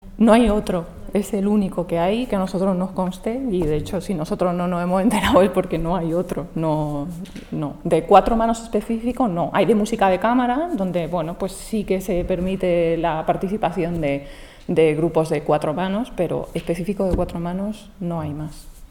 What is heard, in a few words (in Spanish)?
piano a cuatro manos